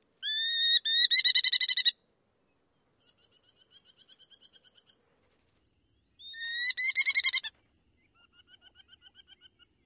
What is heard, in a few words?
Merlin, male alarm